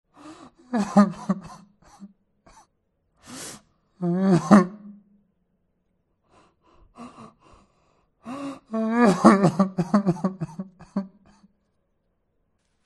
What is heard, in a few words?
cry; reversevape